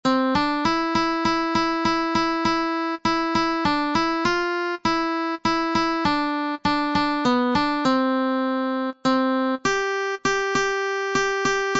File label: Indian National Anthem Guitar